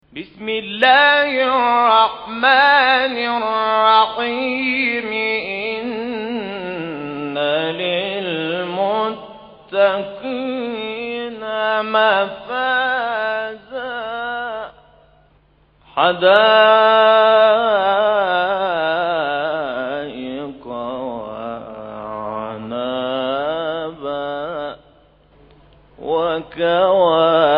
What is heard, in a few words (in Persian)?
تلاوت آیات ۳۱ تا ۳۶ از سوره مبارکه النبا; شیخ محمود الشحات انور